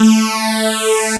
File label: saw lead synth